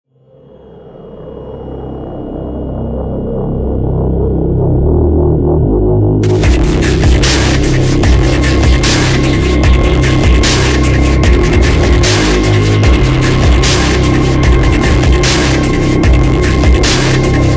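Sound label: background music; freak fortress 2; corrupted barney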